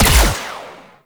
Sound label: Energy Ball Fire; Warframe; Corpus; Mine Osprey